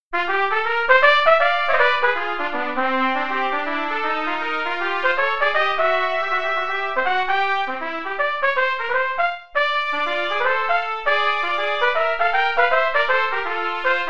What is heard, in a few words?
Play-a-long, Bop Duets, qn=160, trumpet; Bower